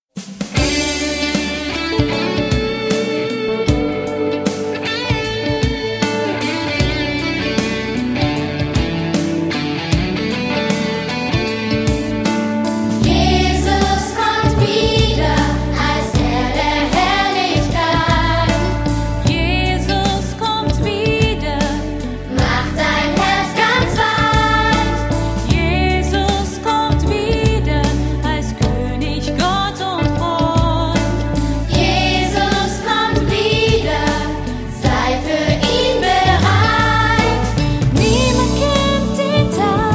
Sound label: Gospel Celebration Kids